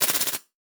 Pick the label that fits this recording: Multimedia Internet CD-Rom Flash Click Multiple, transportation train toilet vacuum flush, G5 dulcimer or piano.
Multimedia Internet CD-Rom Flash Click Multiple